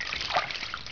WATER

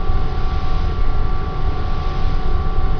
rack hiss loop

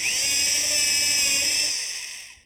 DRILL, CONSTRUCT; AKAI; 1000 Sound Effects

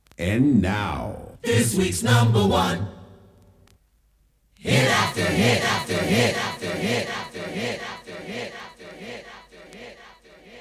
jinglepackage; topformat LP jinglepackage 2

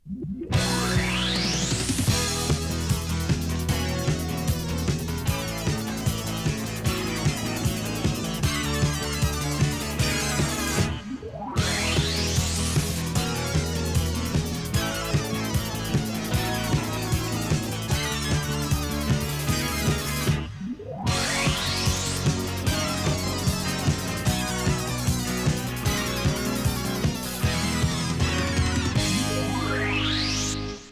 topformat maxi jingle single

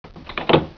UNLOCK, Deuren; sound effect

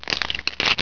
SPLUT, Water

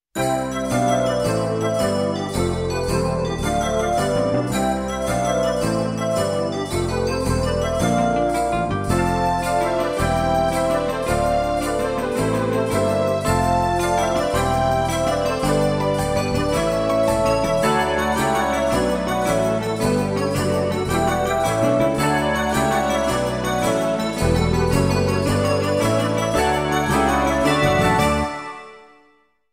Snowbells; Focus Music

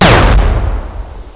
LASER

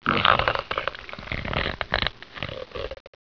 GRUNT

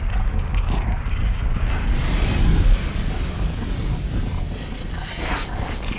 volcano loop

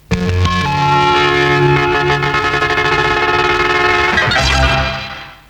sun Shine Jingle; SunshineJingles

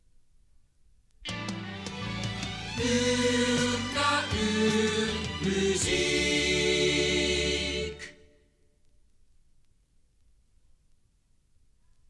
topformat maxi jingle single

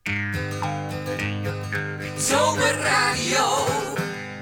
jinglepackage; topformat LP jinglepackage 1

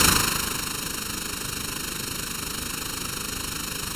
SYNTH ROLL, FM SYNTH; AKAI; 1000 Sound Effects

